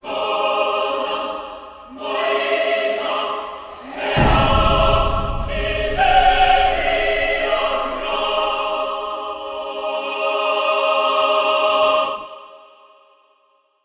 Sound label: Choir; Age of Conan Hyborian Adventures; Age of Conan Launch Trailer Choir